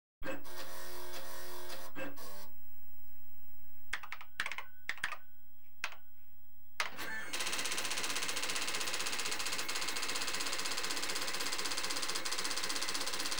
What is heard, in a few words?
Computers, Telex AND Typewrite; Computers Printers Phones; BBC Sound Effects Library